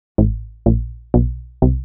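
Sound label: synth bass, house, 125, A; eJay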